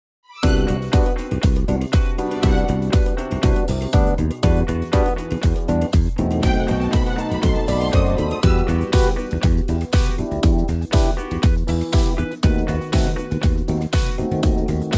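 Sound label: smooth E minor; key - Em - vocal range - E to D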